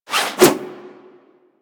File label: Zipline Brake Foley; Gadgets; Parkour; Warframe